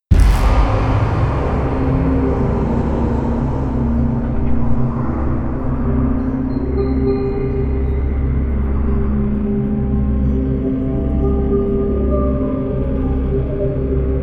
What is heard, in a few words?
CREEPY PIANO